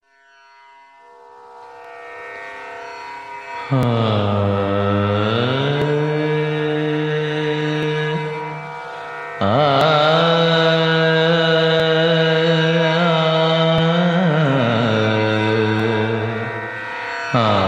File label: Raga Tamil